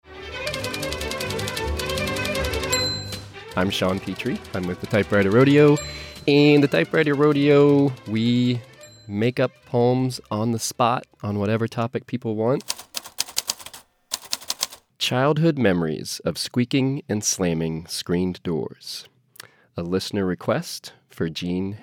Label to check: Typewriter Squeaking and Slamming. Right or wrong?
Right